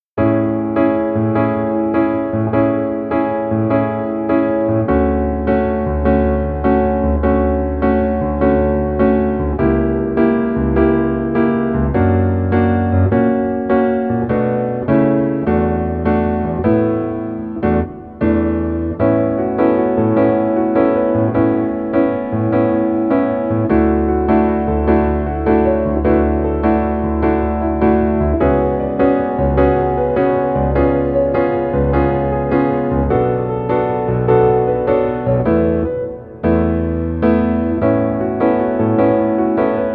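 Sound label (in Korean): Piano version 멜로디 MR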